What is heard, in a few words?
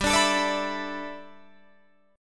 G 6th Add 9th G Guitar Drop D, chord